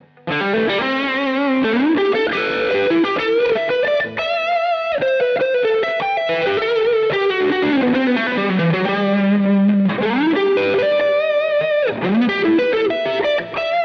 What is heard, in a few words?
Amber Overdrive Custom low tone